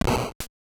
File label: slap; floppy